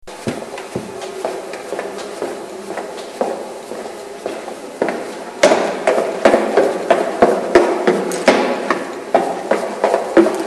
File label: stairwell; palais dietrich